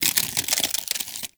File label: Armadillo Foley Scales Roll; Warframe; Hunting Critters